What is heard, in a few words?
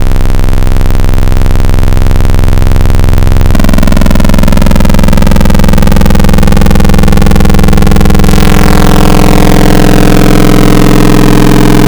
Add Harmonics, Future House Wavetables; Cymatics; Serum